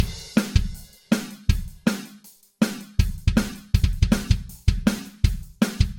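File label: rock rhythm four quarters, drums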